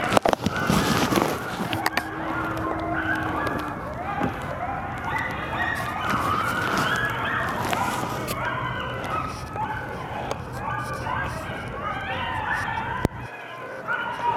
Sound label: siren chatter, coyote voicings; Howls, Yips, Barks, & More